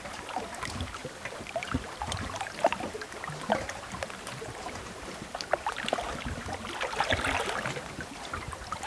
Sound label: water gentle